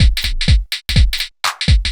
HOUSE BEATS 125

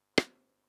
Snares & Claps, One Shots

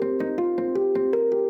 ethno, 160, C

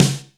Snare

